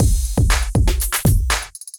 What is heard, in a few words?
Electrified Kit; Electrified Kits